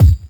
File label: rich mid range bass drum single hit f key; Duration 0:01; Samplerate 44100 Hz; Channels Mono